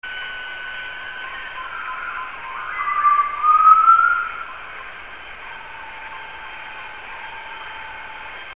bornean peacock pheasant

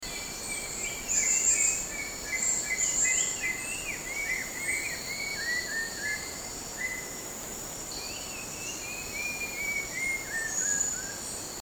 rufous-crowned babbler

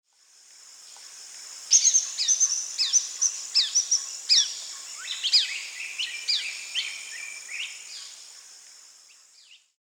grey-rumped treeswift

